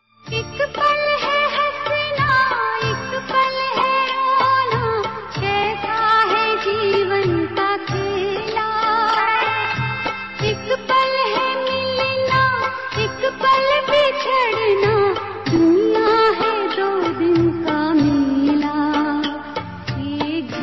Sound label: sad indian tone song ringtone; bollywood